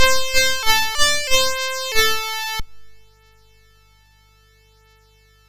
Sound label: MOS6581R4, GAMES; Windwalker